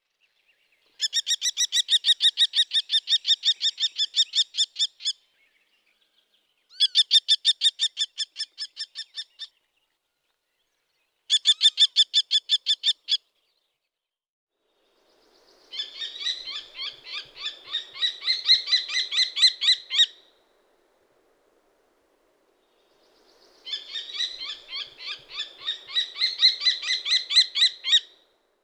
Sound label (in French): Faucon crécerelle; sonotheque ornithologie